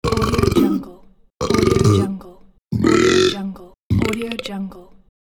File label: burp pack